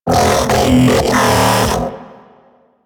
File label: Mech Ambient Vocal